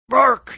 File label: bark